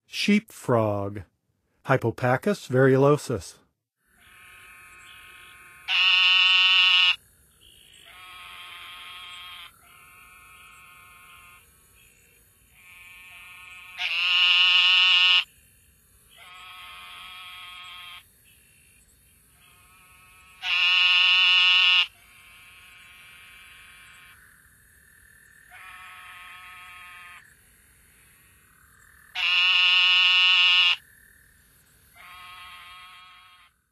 Sheep Frog; North America; Songs of Frogs & Toads of North America

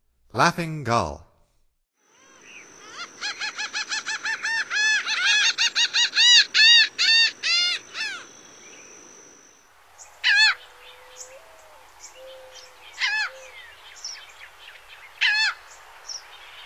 Laughing Gull; North America; Stokes

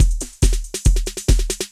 techno 140

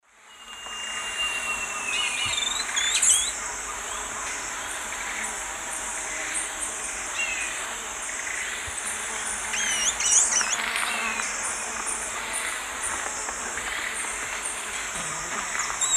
Pachyramphus castaneus, calls; S. America; Eastern Lowlands

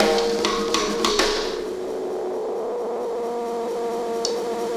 ZERO GRAVITY BEATS

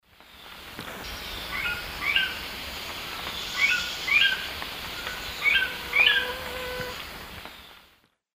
Rhytipterna simplex, calls; S. America; Eastern Lowlands

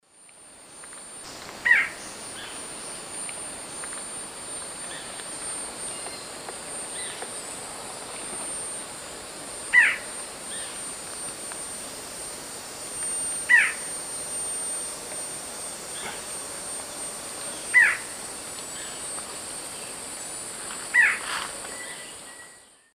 Thamnophilus murinus, call; S. America; Eastern Lowlands

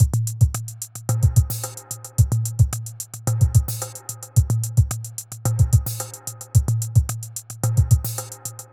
r n b kit 110h

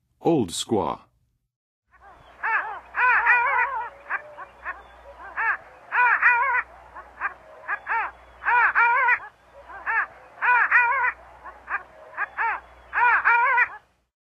Oldsquaw; North America; Western USA; Western USA Songbirds

